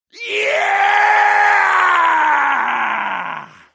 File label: yeaaaahhhhh